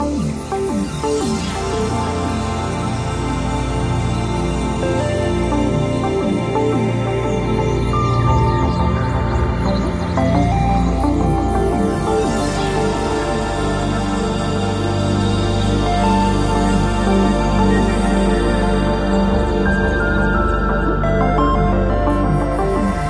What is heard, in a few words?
Redforest Ambient Altloop Nodrumsbass; Epitasis